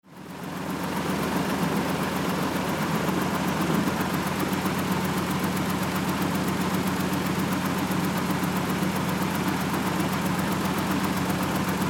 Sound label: Engines; Sound-makers